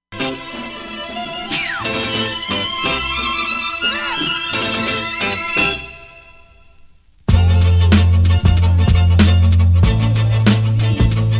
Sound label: beat